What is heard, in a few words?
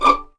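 D6 pizzicato mezzo forte left, violins; East West; The Ultimate String Collection; VLNS PIZZ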